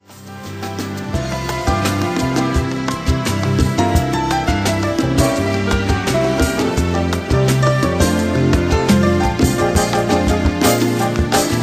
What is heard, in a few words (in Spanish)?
al ritmo de piano